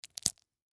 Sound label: Sticker Remove, Sticker Remove Peel Price Tag; Ripping; Objects; Blastwave; Foley